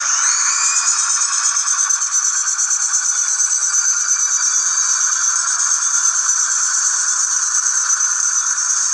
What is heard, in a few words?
Siren